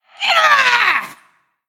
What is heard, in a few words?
Female Troll Drakkari Death